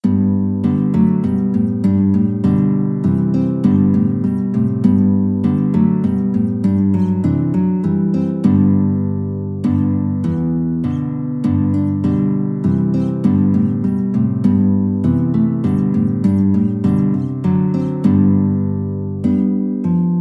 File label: guitar